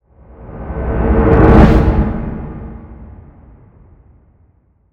Backwards Swoosh; SFX Royal

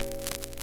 Vinyl static, Noise; polygoniix Intro Essentials pt2; Electronic; Glitches; NoiseCollector

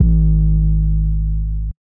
808, trap drum kit; Flash; MZ BEATS - FREE TRAP DRUM KIT

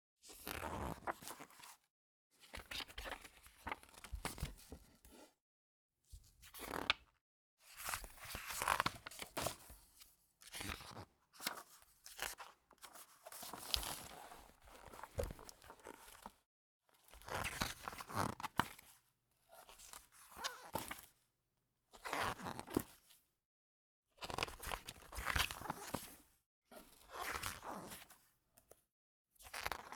turn paper journal creak

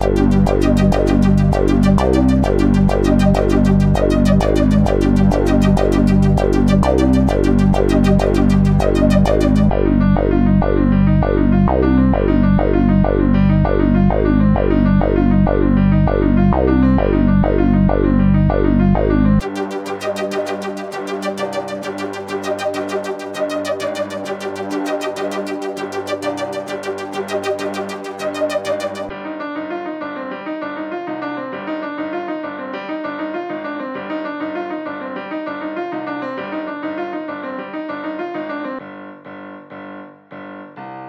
198 Bpm G Phrygian, loops; Detroit Drumkit And MIDI Files ''Hard Times''

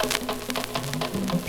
LP loop, Vinyl static; polygoniix Intro Essentials pt2; Electronic; Glitches; NoiseCollector; voodoo

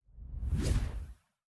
digital whoosh

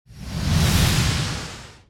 Epic Swishes; SwooshSounds-2

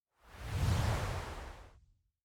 big whoosh

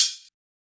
HiHats closed, trap drum kit; MZ BEATS - FREE TRAP DRUM KIT

